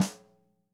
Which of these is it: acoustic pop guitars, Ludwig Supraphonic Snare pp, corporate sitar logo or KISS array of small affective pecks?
Ludwig Supraphonic Snare pp